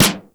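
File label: snare, plastic drum; 90 sSampleCDs; Crypton - DrumBase5000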